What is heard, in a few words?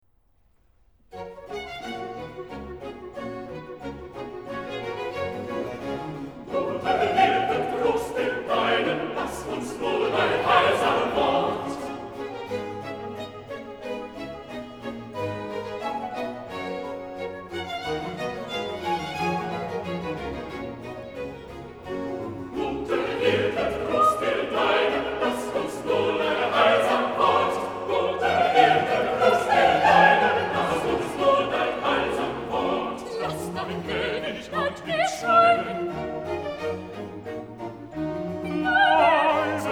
Chorus; Erwunschtes Freudenlicht, BWV 184; Guter Hirte, Trost der Deinen (Chorus, Soprano; Bach, J S